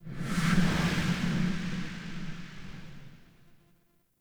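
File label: UI Siege End Abandoned Stinger; Siege End Abandonned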